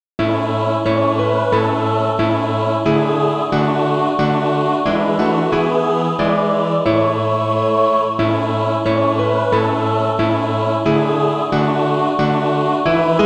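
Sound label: mixed, Practise Music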